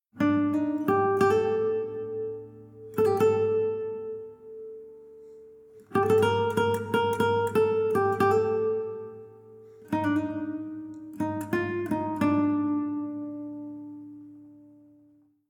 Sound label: Savannah Music